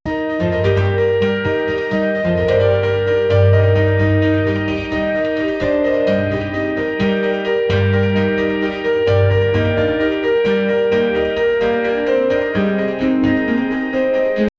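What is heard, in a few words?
emotional piano background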